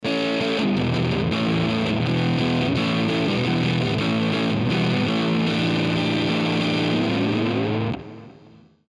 Crusher; IK Multimedia iRig HD 2

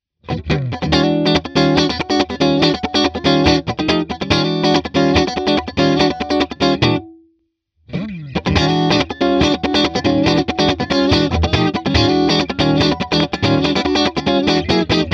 Funk Chords; Universal Audio MAX 1176

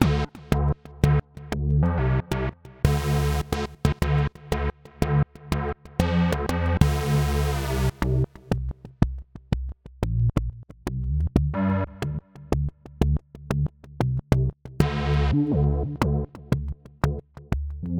Snappy Unison

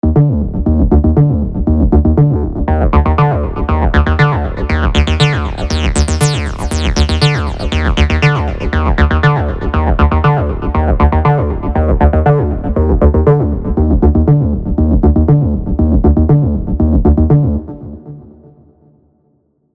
Avenger AR Like Bass

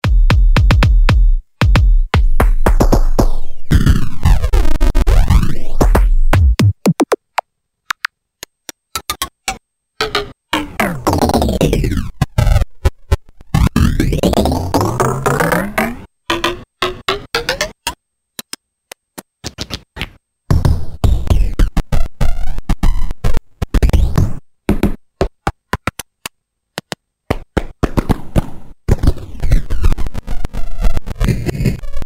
Sample pitching; Io Instruments Himalia

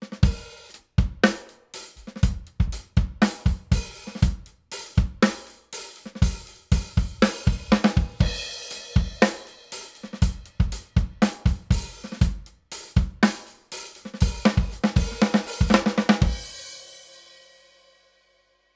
Rupert Neve Designs 5254 Drums

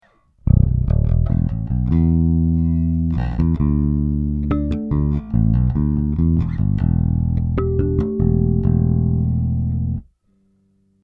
Jbass Fingerstyle neutral; HB Block 800b

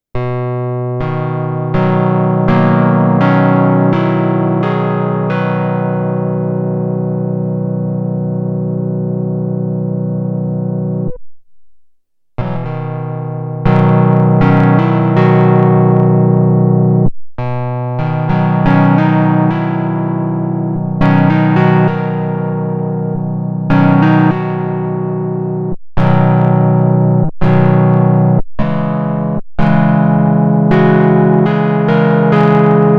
Pulse 2 Para 4

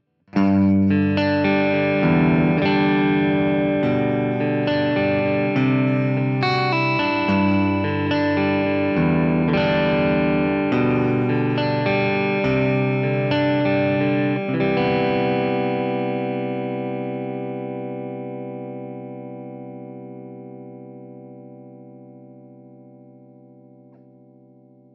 Neck Voice 2, Schecter SLS Elite PT

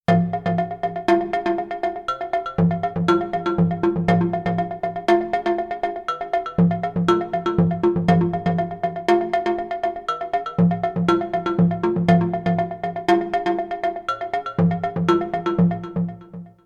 Sequential Trigon 6 Congo Bongo

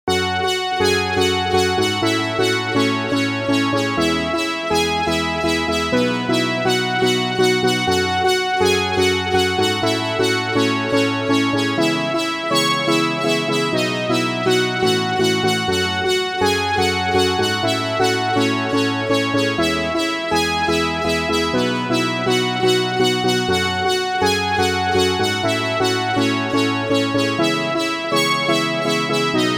CS90 Oldskool Brass

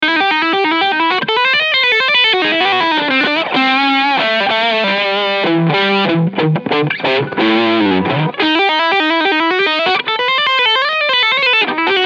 drive medium push full mid mid freq tone full; JHS PG-14; 15 uhr; 11 uhr

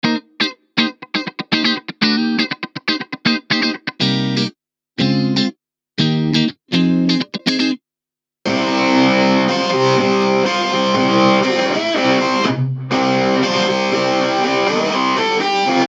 Reamp Git

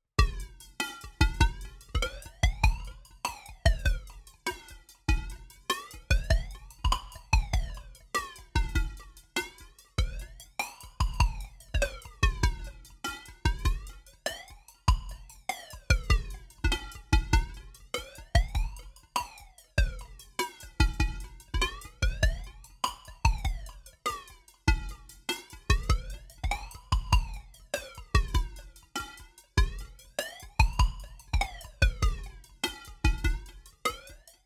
TC2290 DT Drum Modulations

